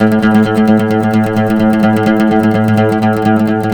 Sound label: PLK OUD, Roland LA Composer